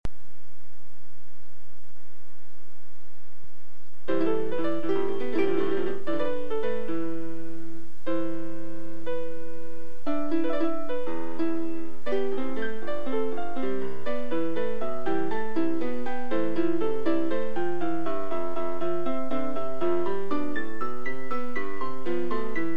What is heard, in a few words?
piano; sponge theory; clatter and drone